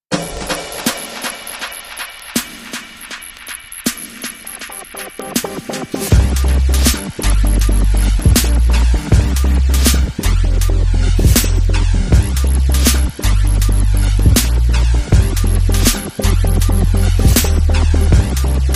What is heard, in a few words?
Strumming Full